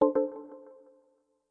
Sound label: windows 10 usb error sound